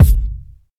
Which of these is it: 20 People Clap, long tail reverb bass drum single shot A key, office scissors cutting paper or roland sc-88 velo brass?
long tail reverb bass drum single shot A key